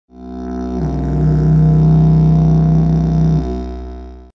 didge tenth

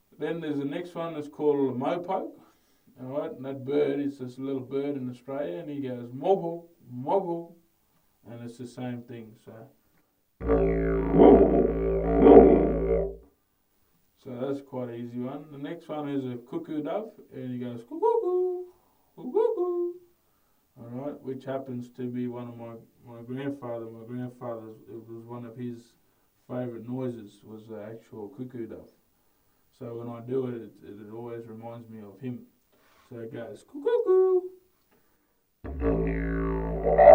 Didgeridoo teach

